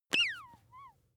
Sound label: bath duck release soft